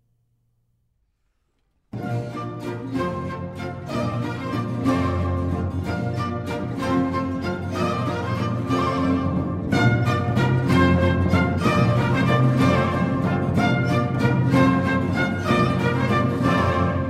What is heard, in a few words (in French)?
Menuet; Lully; Jordi Savall; Le Concert Des Nations; L'Orchestre Du Roi Soleil